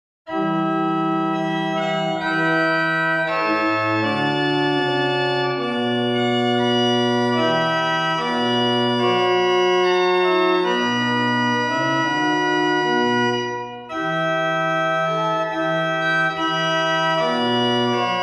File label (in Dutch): improvisatie psalm 5; krewerd